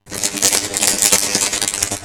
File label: Electric Arcing